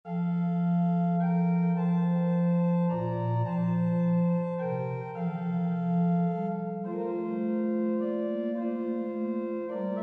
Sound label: OrganMusic; Souvigny; Dandrieu JF; Puer nobis nascitur